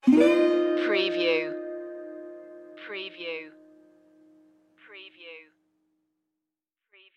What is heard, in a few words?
tiki beach